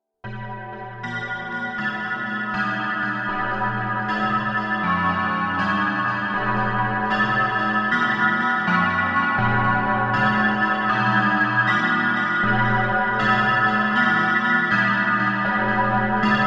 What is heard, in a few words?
large bell change improvisation